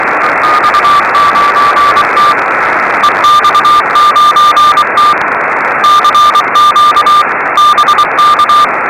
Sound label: short loud